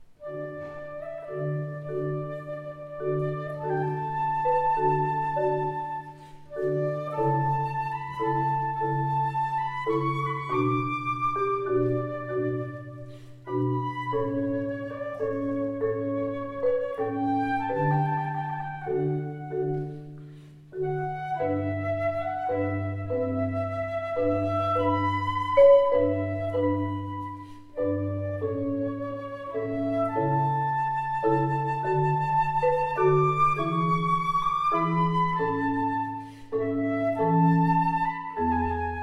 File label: marimba flutes Largo; Vivaldi Chardonneret